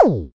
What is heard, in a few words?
electro perc; STYLE KITS (Hip Hop, Etc); Precise Electro Percussion